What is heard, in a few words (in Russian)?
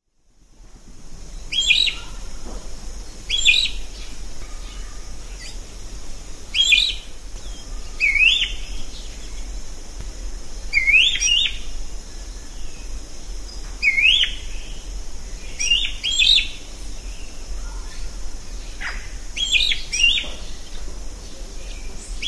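черноклювый дрозд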